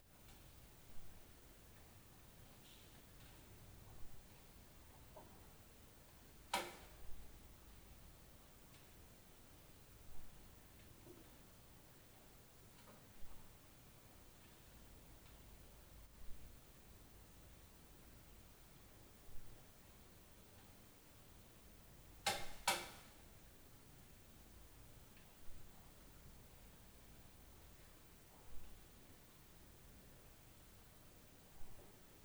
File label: RAIN NOISE on Heating, Night Noise